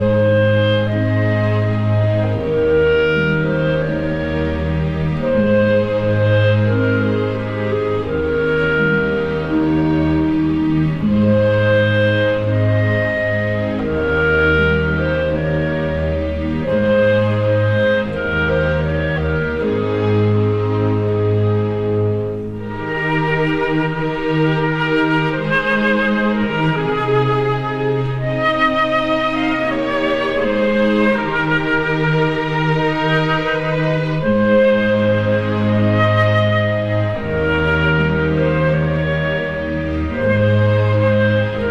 Symphony Orchestra, Wiegenlied (Cradle Song) (arr for orch